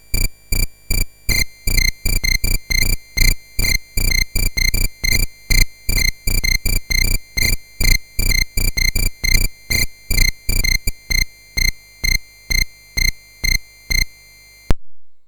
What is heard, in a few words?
Crickets, CSG8580R5; Syl